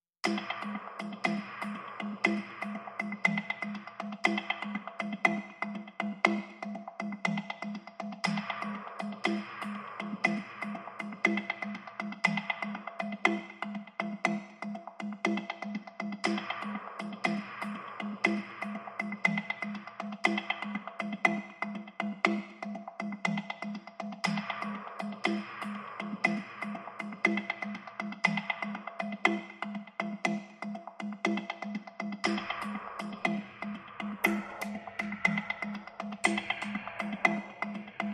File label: Percussion